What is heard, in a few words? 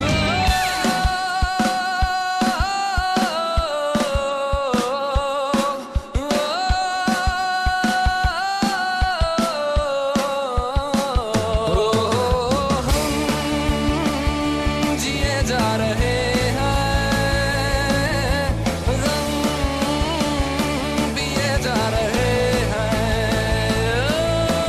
ringtone; blues